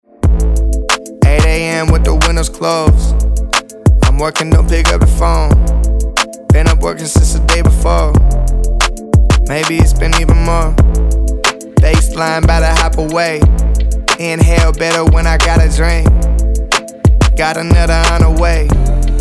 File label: HIP HOP